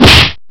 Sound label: 8 Bit Punch Snare